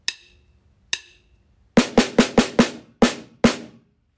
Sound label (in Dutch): Geluidsfragment Slagwerk Ongestemd